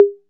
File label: HI CONGA; RAP CITY 1